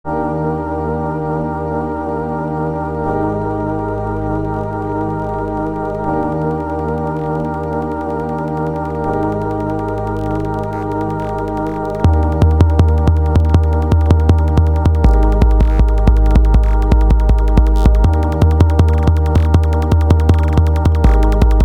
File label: ambient footwork; even more